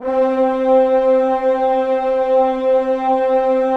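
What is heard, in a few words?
PAD, atmospheric pads; Best Service; XX Large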